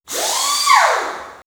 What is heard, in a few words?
Elec FX Sci Fi Creature; X-Static Goldmine